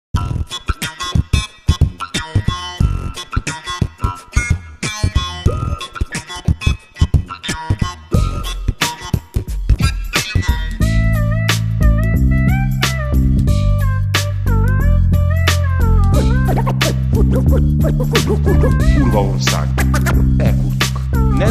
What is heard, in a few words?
rap; Gyurcsány